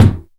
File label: Drum, Roland JX-305